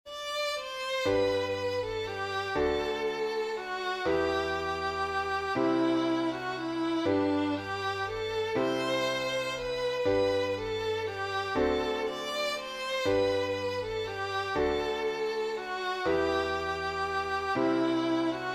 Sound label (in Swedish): sommarvalsen